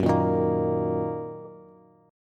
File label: Gb 6th Gb Bass; Gb6 strummed; JGuitar